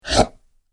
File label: Dino Dragon Chomp; Dinosaur Sound Effects; Monster Sound Effect